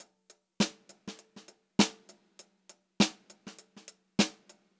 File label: VAR, Funk-Groove Drumloops